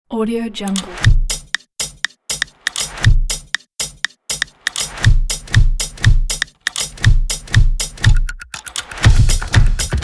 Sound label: this is drums